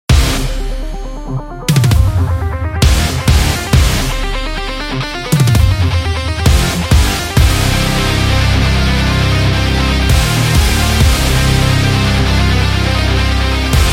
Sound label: This Is Metal